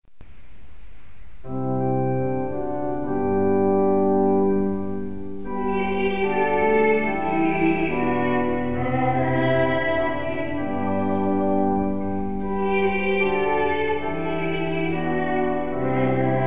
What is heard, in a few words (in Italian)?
Kyrie contralti